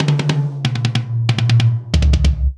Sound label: Loop